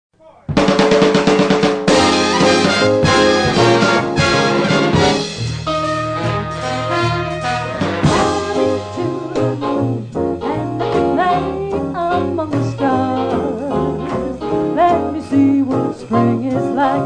swing

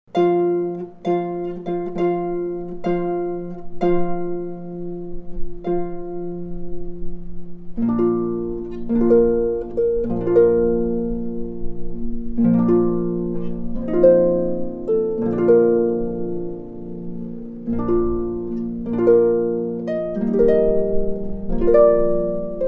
Bridal March

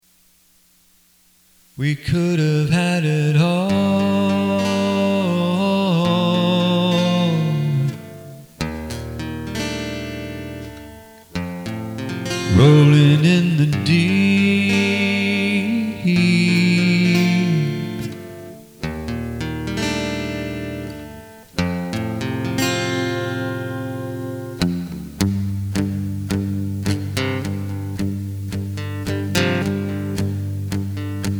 Acoustic Guitar

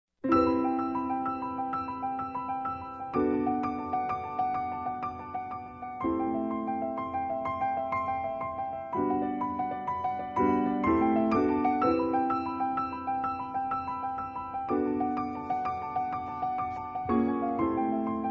Piano

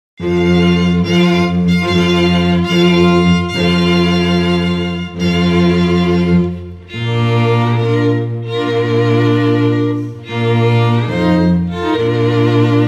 String Quartet; Bridal Chorus; Classical; Violin Guitar Musician

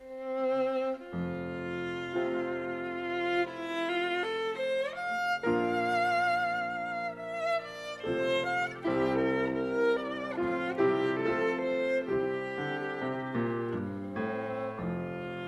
classical trio

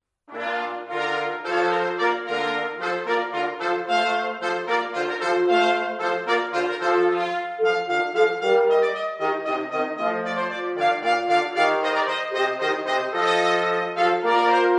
Hornpipe, brass ensemble; Handel

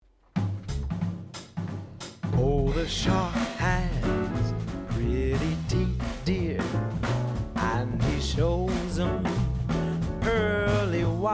Jazz

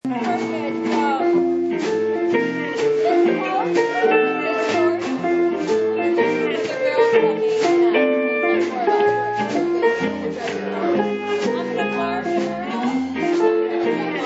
Jazz; dance band

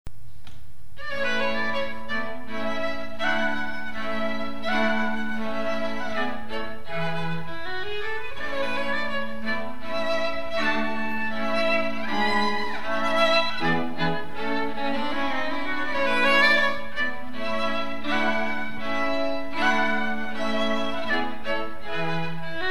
String Quartet First Violin; classical violin; Mozart

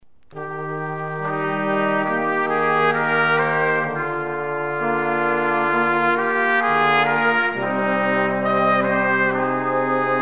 brass quartet; Arioso

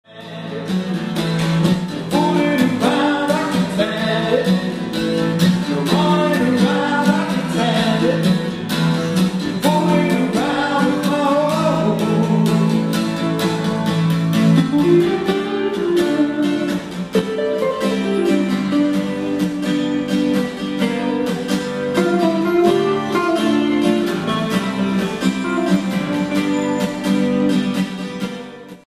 Acoustic Duo

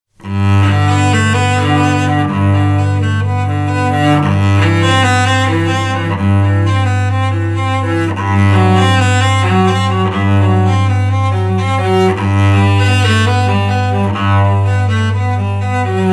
Prelude, Cello; Bach

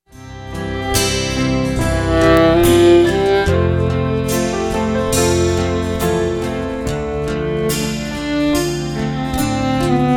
duet, String Quartet

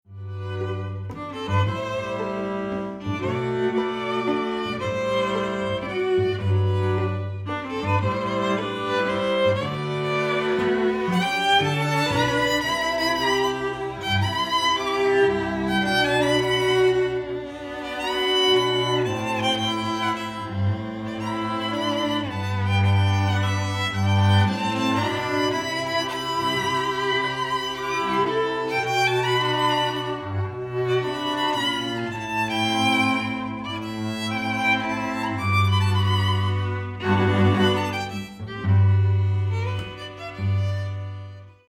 string quartet

